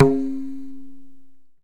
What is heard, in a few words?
SUR, Tabla; Mango Loops